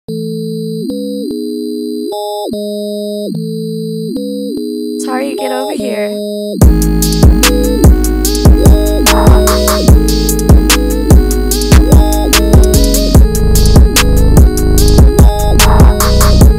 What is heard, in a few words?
Instrumental